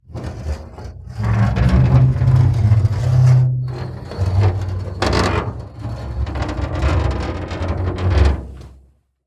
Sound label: Just Metal Squeaks and Moans; soundbits